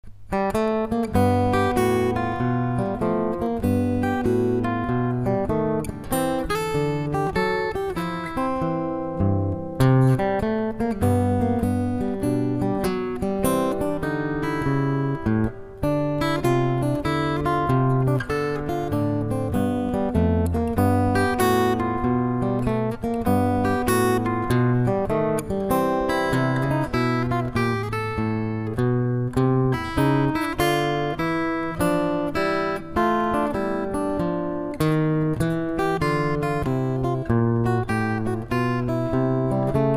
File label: Rolling Blues